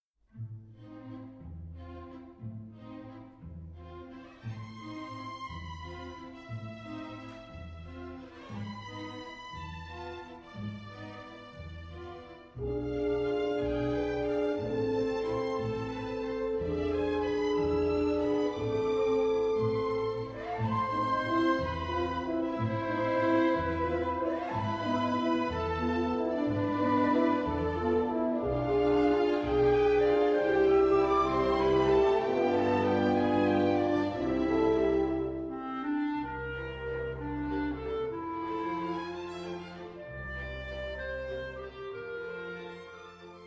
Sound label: Waltz; Music For Masquerade